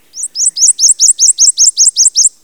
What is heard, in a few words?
bird